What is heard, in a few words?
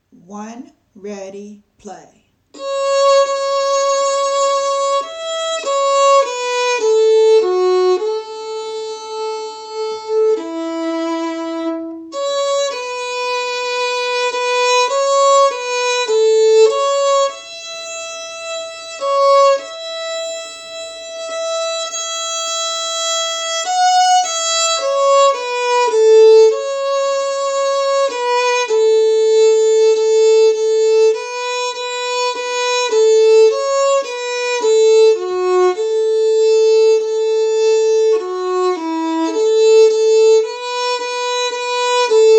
Smokey Mountains A